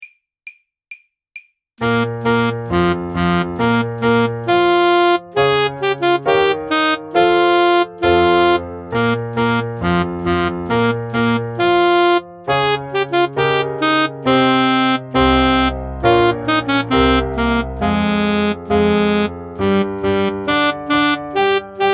cossack dance sax

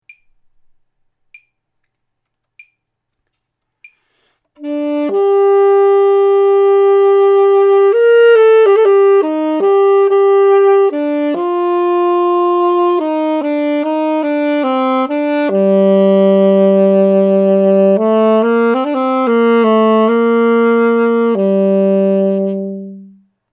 vieux chateau sax sol

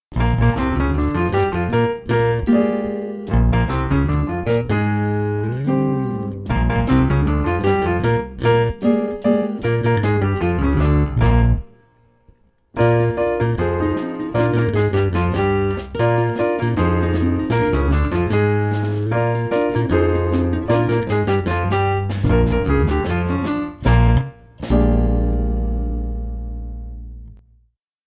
saxophone; mib

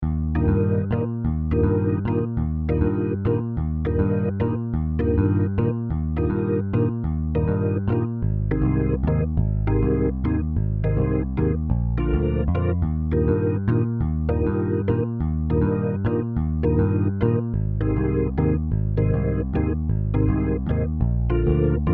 saxophone; mib

